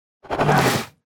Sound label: Spider Grunt General Scream Ending Combined; Deep Rock Galactic Boardgame